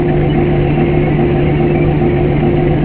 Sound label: tank; ASSAULT; PC Gamer 46